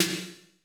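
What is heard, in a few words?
wet room snare 808; Masterbits - Soniq Elements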